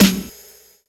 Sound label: sparkle snare single hit g key, steel snare drum sample tuned to the G note